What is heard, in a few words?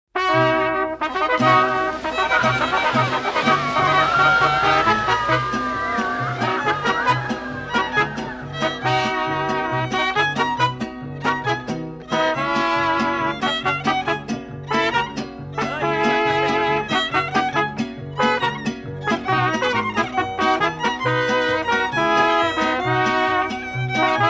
fiesta and flamenco